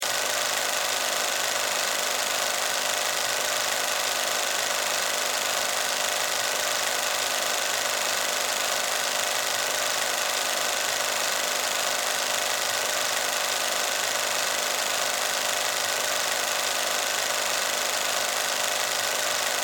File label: Film Projector Run 16Mm Loop; Technology; Projectors; Offices